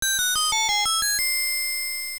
Secret, Found a secret / Correct solution / Puzzle solved; LOZFDS